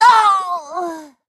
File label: death